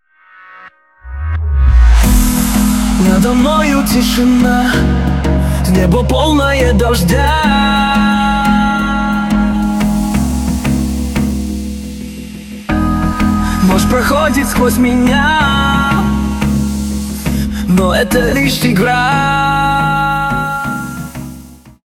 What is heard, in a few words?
generated dozhd pesnya; Gemini 3.0 Flash Preview; Google